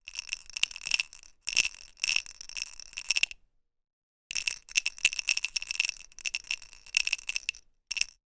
toy puzzle bubble lid game ball movement around plastic maze